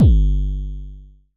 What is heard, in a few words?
Dist Kicks G1, Vermona Kick Lancet, Distortion Kicks; Drums; LookIMadeAThing Kicks